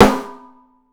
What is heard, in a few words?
OPEN PICOLO, 3D Drums